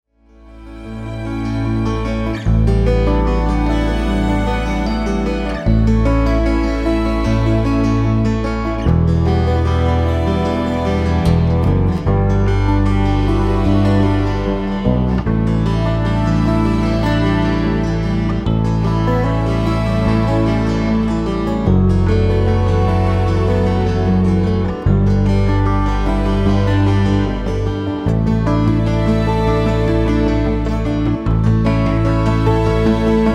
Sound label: Peaceful Yoga Lounge